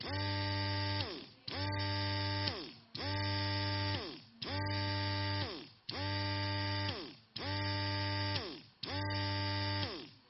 Breast Pump

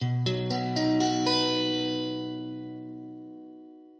B maj

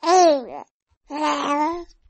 Nursery Baby Awake

